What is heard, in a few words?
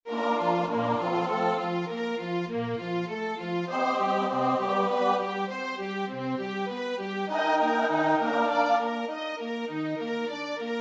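soprano alto tenor bass; Keiser (Reinhard); St.Mark Passion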